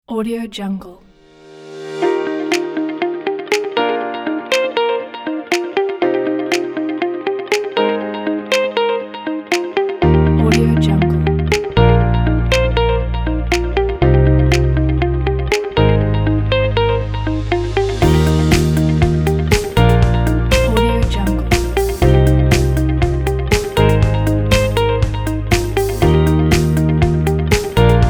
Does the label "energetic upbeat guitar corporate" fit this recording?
Yes